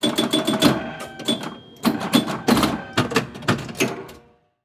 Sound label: Technology Electronic Pinball Machine Retro Machine Played